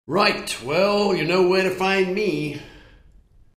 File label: SMS; PlanetX